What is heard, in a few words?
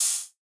OH; Hats and Cymbals